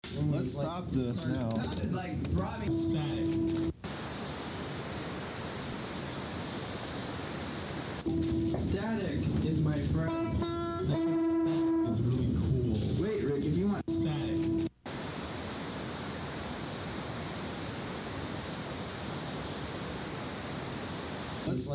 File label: Static